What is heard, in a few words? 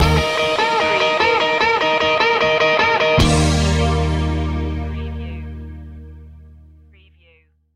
surfin stinger